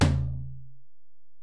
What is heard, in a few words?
Tom, Alesis DM-PRO, Drum Machines, Toms